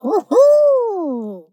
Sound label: player victory; fallguys